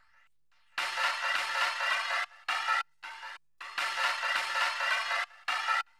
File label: HIHAT, Drum Bass, 160; eJay